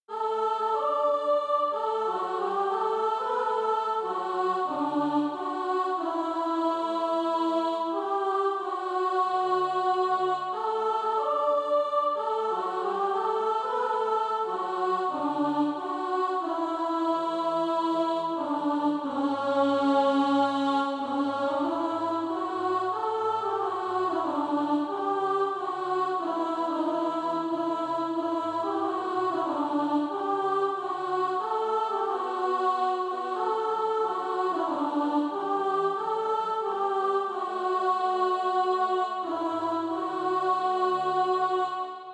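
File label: alto, Practise Music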